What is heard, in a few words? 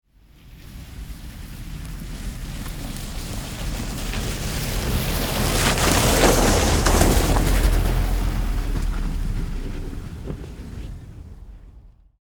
Saturn Vue, Automobile, Pass, By, Slow, Snow, Grass; Transportation; BlastWave